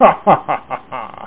laugh; Saddam's Revenge 1.1a

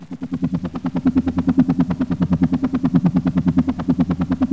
Helicopter; GMPlay

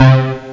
cool bass; Epic Collection 3, The; Epic Marketing; muzak

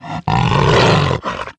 lion attack; Immortal

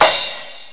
cymbal

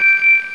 Get Rune; Mythos

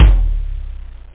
real tom; toms

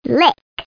lick

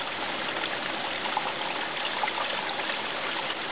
Resevoir; Caesar3

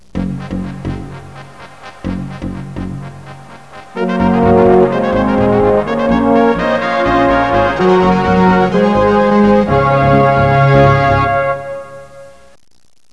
fanfare; MidEastGame

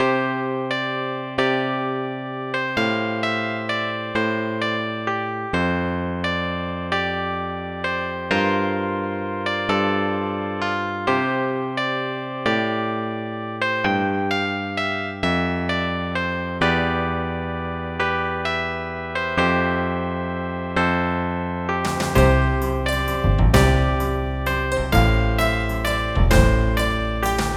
ballad; Millennium Gold 2000

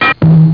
kick trip & fall; amircsounds

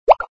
bonus; jumping jelly beans

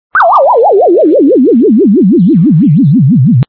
game over sound; bubble t

